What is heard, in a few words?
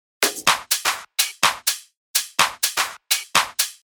Top Loop, 125 BPM